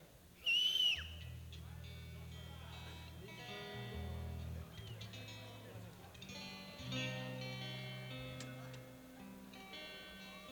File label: Tuning